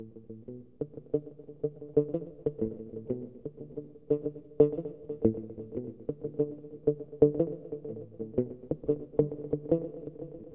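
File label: guitar, A minor, Hip Hop & RnB; Saturday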